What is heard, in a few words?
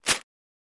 Paper Tear Crunch; paper mvmt